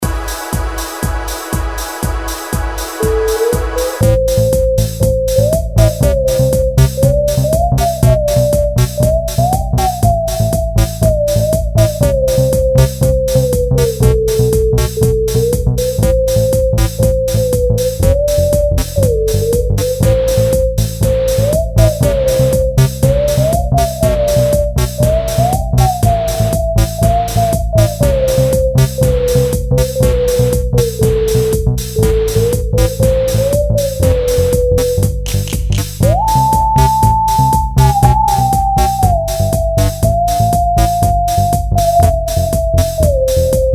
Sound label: edm; インストゥルメンタル; 激しい